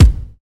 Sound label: rich mids kickdrum g sharp key